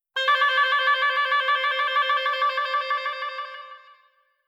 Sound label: trill